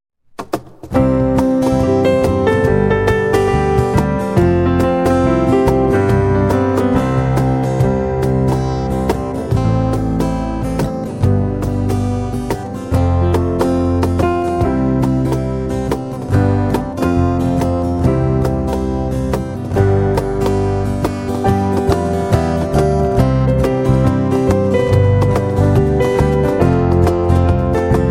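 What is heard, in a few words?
anthem instrumental; eno je potrebno himna 2018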